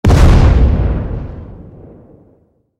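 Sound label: Ambience Destruction Oneshot; Godzilla Smash3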